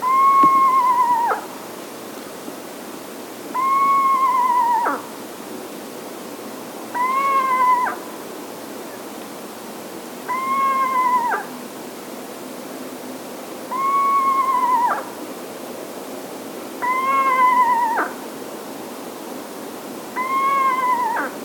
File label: Eastern Screech Owl, Megascops asio; owls